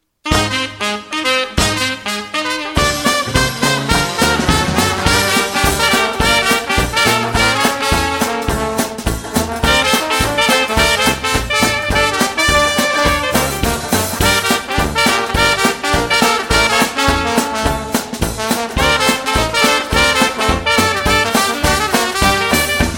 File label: Ragtime; Ragtime Vabank